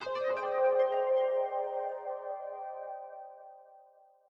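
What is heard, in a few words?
Menu Start, game music; Proteus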